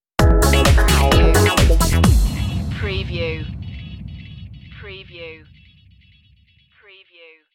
pump steady groove stinger

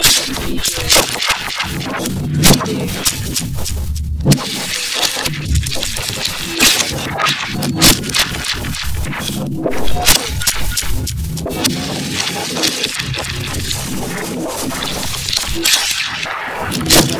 time travel ambience

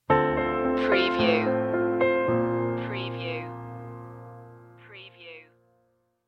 hold me tightly stinger

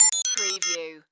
Data Musical Readout; sound-effects-bible

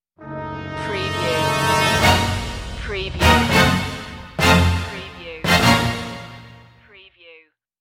sting no choir

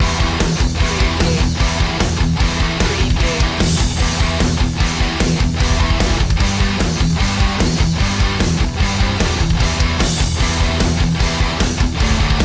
thrill junkie loop

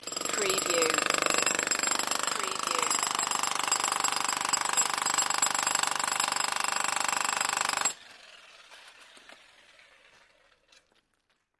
pneumatic hammer

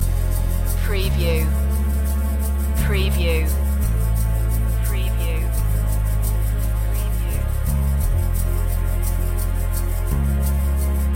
lounge living loop

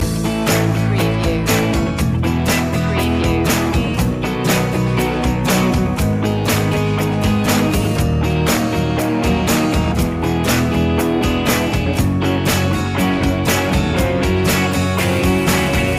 joyful ride loop

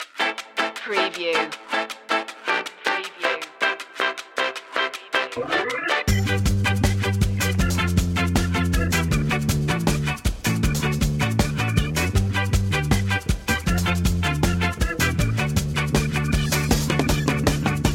ska jump

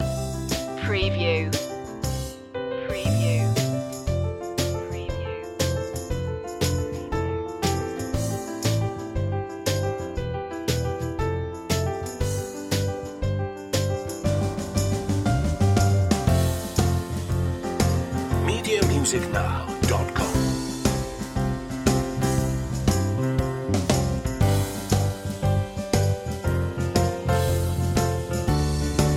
closer loop

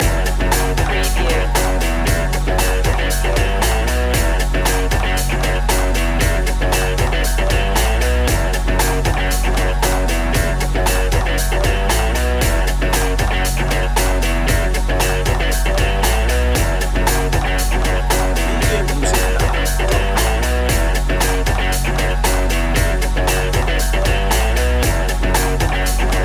boots of fire loop

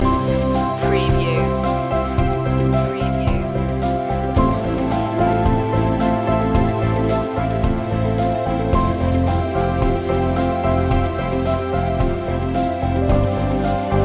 look up loop

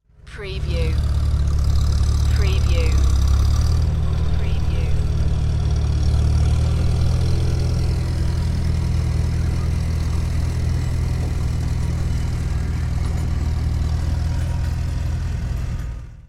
Farm Tractor

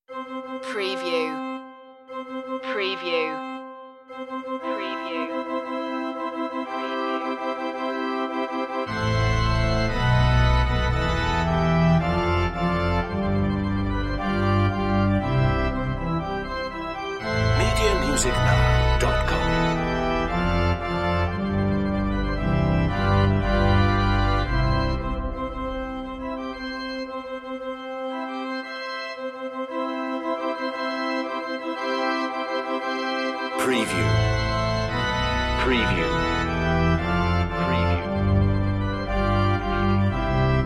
wedding march pipe organ

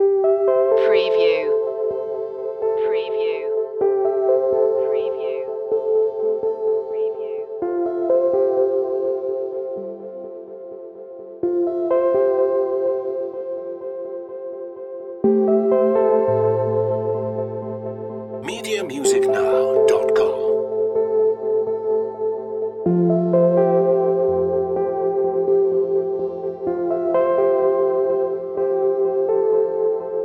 synth only

